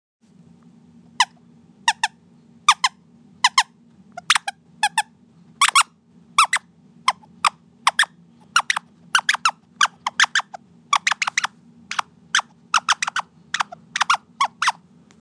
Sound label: Scotch Pips, fox call